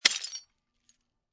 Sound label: Glass Debris Smash, Impact, Glass Debris Smash, Crushing Broken Glass